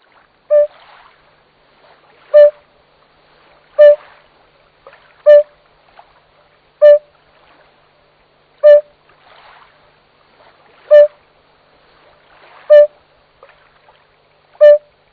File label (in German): gelbbauchunke